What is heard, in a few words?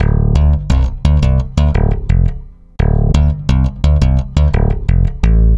Stick Loop, Stick Bass